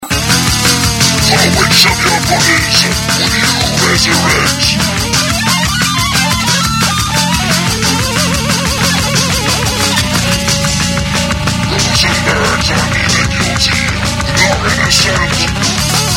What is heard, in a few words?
metal violin; radio